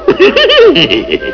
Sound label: laughing; quake2